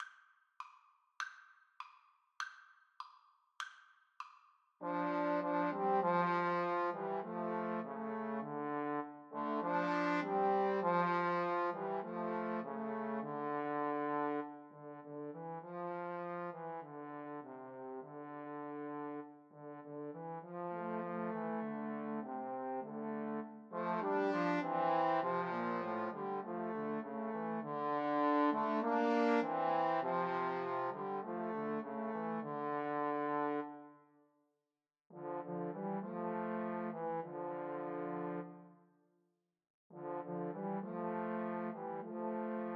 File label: crown roses, Trombone Trio